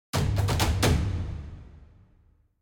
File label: Music Battle